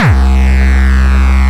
Hard Kick

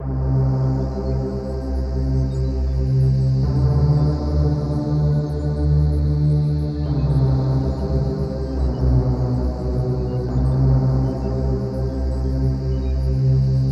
Dark Trance Pad; Nandoo Messany

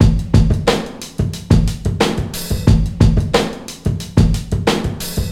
Hip Hop Mpc Sp

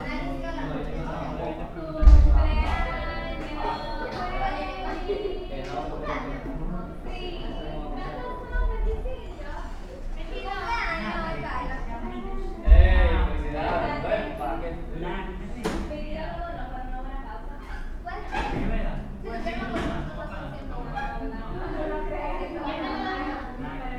Classroom Noise

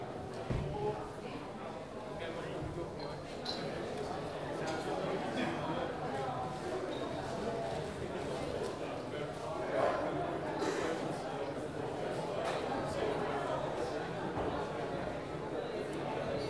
Medium Crowd Murmering

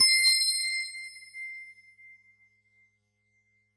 Diamond Collect

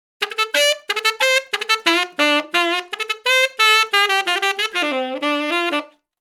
90bpm 4bar; Duduka

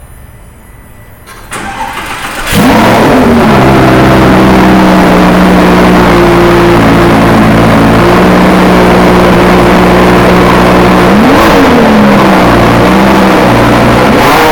Lamborghini Gallardo

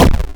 8bit Explosion

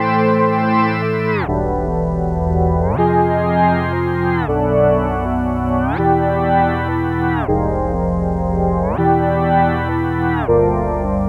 80bpm

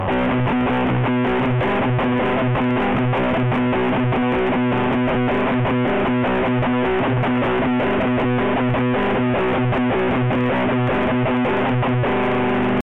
Guitar B Mute Rif

